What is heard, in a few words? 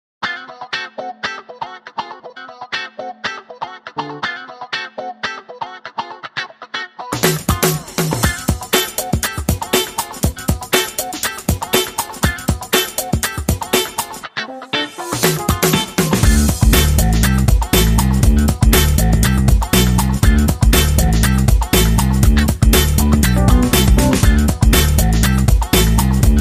Funky